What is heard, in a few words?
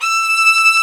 STR VLN, Str Quartet, Strings